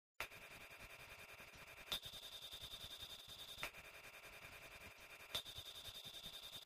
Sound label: Kick Hat Ping Pong